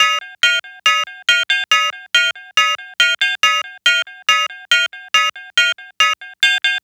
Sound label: NRG SFX, Trance